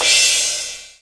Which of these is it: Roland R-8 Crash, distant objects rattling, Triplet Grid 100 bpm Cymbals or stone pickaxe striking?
Roland R-8 Crash